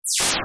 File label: dispersion